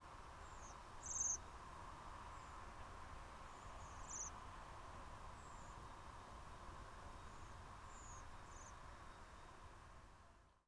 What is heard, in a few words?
secondary modulation, Redwing Turdus iliacus